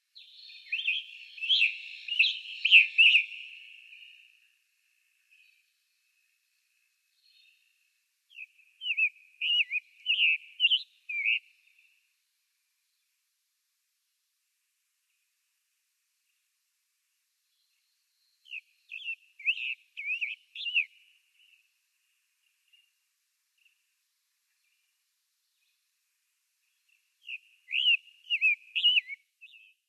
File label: Summer Tanager song